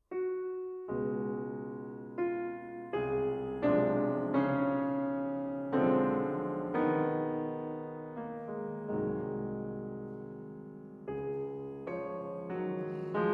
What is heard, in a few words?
Piano Sonata No. 6 in C-Sharp Minor I. Molto Sostenuto - Allegro